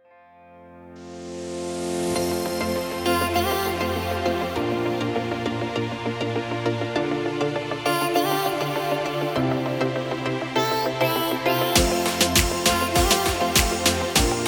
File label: Uplifting Electronic